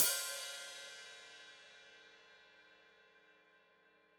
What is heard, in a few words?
Hat Foot Open, Hi-Hat; Deeply Sampled Drums